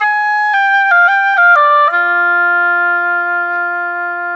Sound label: Oboe, Orchestral, Rock Pop; Sonic Foundry (Sony Creative Software); Orchestral Series VOL-4 - Rock and Pop